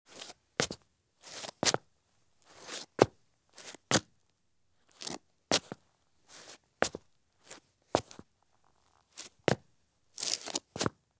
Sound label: Men's Medium Business Shoes on Concrete; Sound Ideas; Footstep Sound Effects